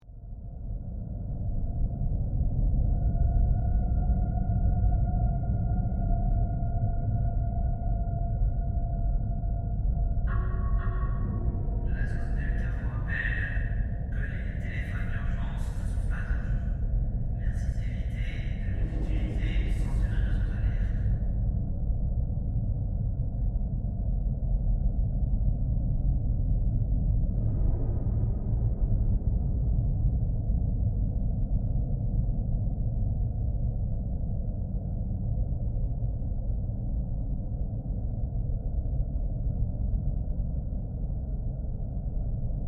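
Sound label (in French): ambience stress avec annonce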